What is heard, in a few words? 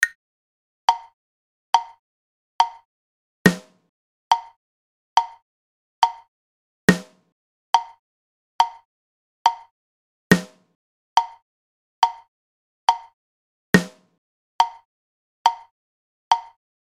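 whole note, drums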